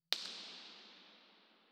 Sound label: IR sweep BIN; Bergamo; EAW50; IMP-RESP